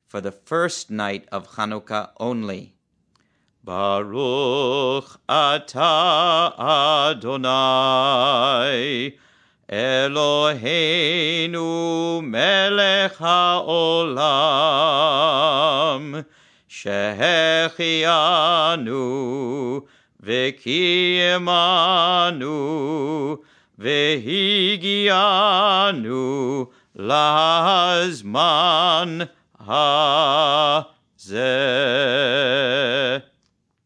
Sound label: Shehechiyanu Chanukah Chant; mitkadem hebrew for youth; ramah